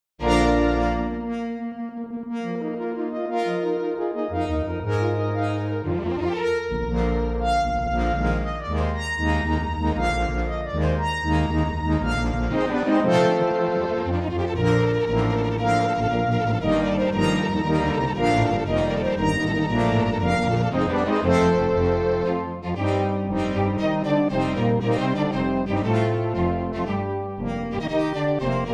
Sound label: horn